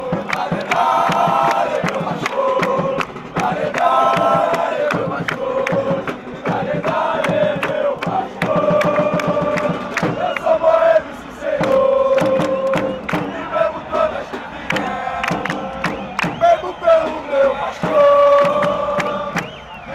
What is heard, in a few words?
vasco da gama chant